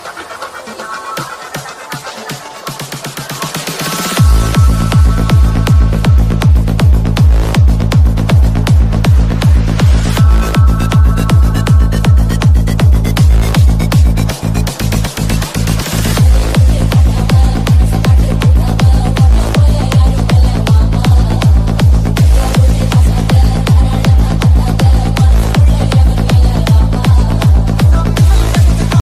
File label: malayalam dj remix ringtone